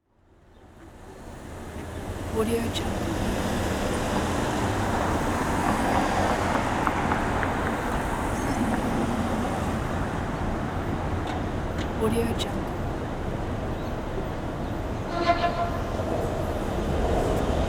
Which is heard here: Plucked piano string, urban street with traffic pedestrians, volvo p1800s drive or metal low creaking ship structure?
urban street with traffic pedestrians